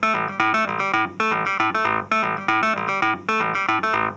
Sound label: Cruisin' Disco Clavinet, 70s Analog Funk